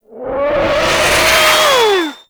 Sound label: Artillery Whizzby; Enemies; Abilities; Warframe; Teralyst